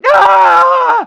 ieaaaa

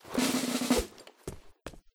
emote salute foley; fortnite emotes; athena

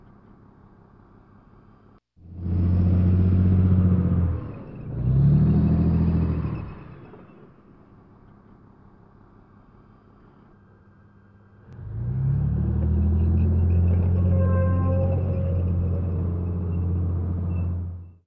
tank movement, ambient

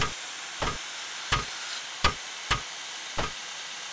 burned out, ambient

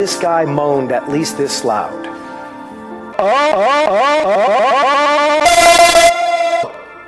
moan

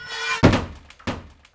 shutter, ambient

